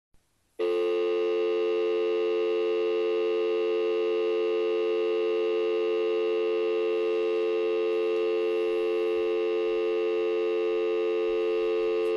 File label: fios dv dial tone timeout